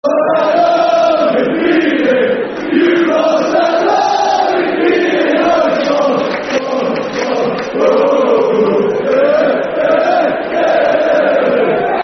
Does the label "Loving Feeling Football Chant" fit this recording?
Yes